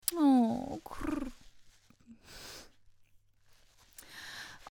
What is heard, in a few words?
Coo